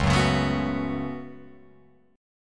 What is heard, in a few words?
Db 7th Suspended 4th Sharp 5th Db Guitar Open F; JGuitar